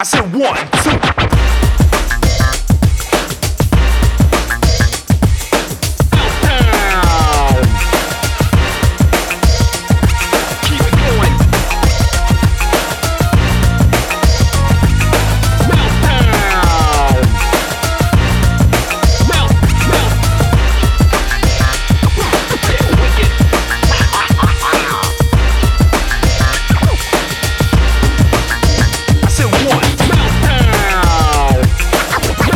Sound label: 100B